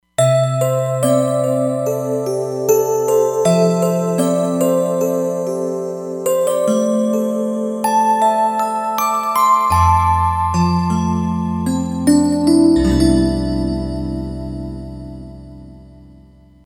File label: Ballad Bells, Roland Fantom XR